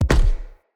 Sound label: Bow Shot Punch; Weapons; Player; Josalfar Bow